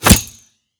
bow fire

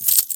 gold up; skyrim

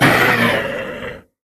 npc draugr aware

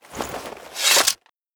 blade 1 hand sheathe; equip

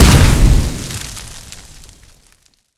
incinerate impact; fire; skyrim

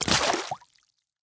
water walk; skyrim

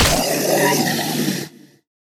draugr death; npc

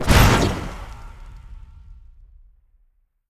illusion fear; skyrim

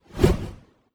axe 2 hand bound swing

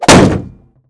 shield light bash

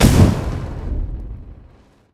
firebolt impact; skyrim

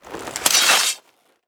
blade 1 hand draw; equip

